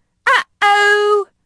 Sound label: Error; kids-tetris